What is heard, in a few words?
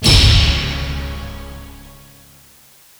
level UP; PristonTale